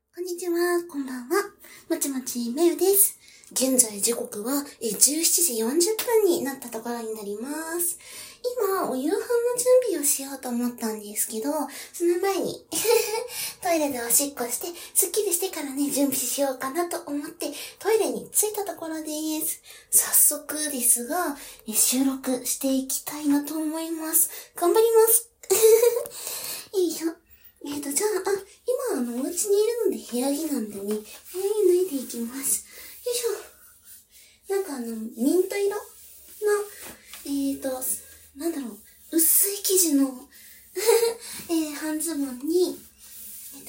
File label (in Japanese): おしっこ実演 トイレでおしっこ